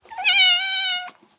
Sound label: Kitten Meow; SES Animals SFX